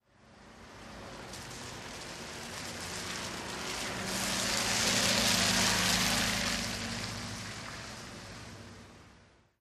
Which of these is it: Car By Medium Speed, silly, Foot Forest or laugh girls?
Car By Medium Speed